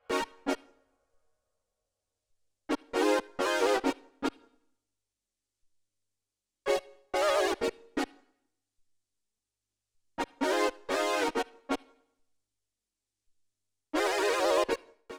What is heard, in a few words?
synth 32 beats